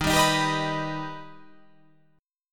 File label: D sharp Augmented D sharp, chord